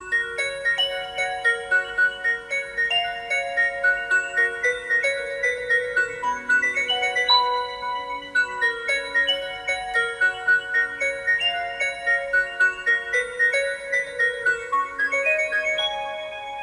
xiaomi sunrise redmi ringtone

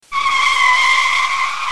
vehicle skid ringtone

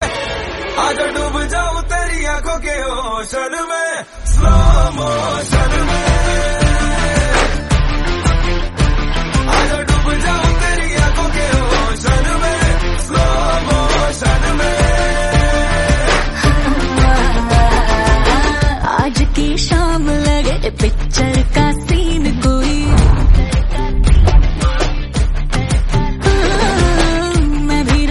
bollywood song tone ringtone

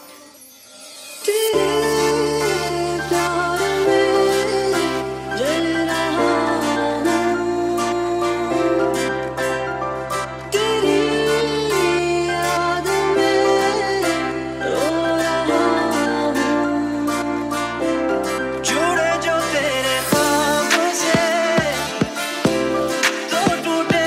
bollywood sad music ringtone

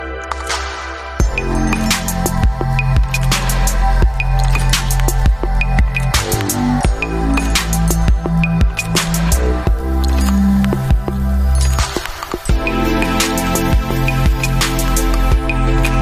Nokia new ringtone

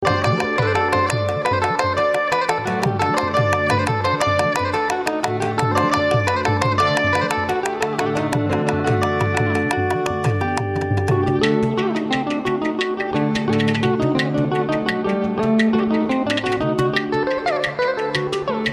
mandolin carnatic ringtone

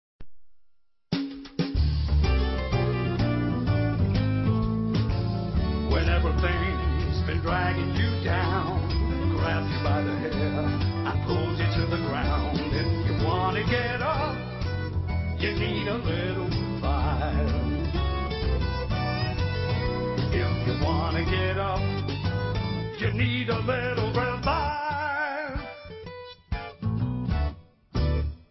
revive jingle cool ringtone

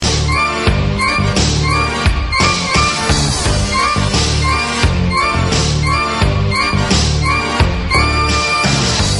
iphone drums sms ringtone

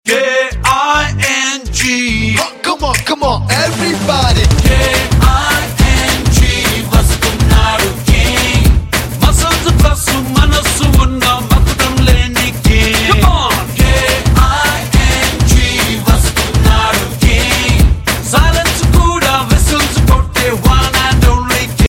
telugu ringtone

